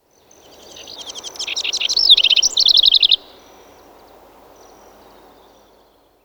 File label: Canary; افکت صوتی